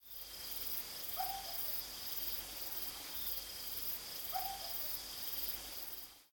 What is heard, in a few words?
ambient cricket; STALKER